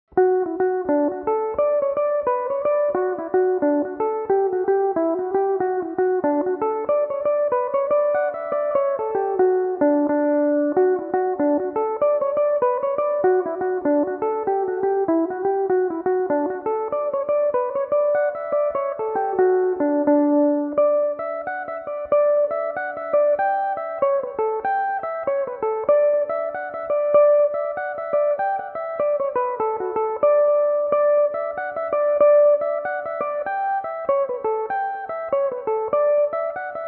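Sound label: D major belmuse, irish mandolin; Up With The Lark; Belmuse EM-190 electric mandolin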